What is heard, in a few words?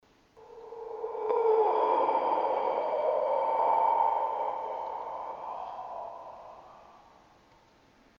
angry; csgo; eternity; scp; 966